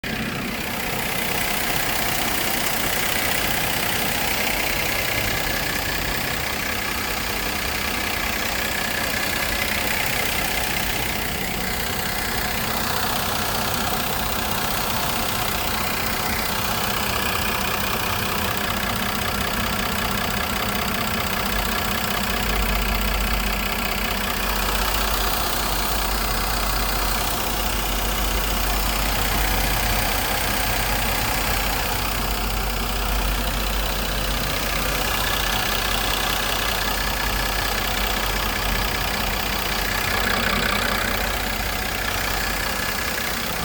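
oil pump solenoids 50km m276 naturally aspirated gdi idle; w212 amg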